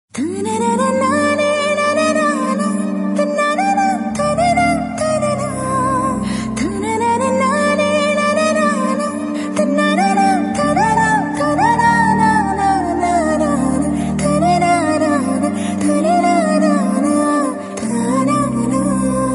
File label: Tamil Ringtune